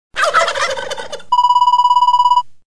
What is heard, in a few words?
wild turkey